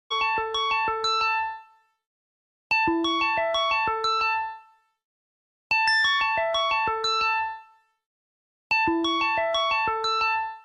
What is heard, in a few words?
ringtone; 심 수련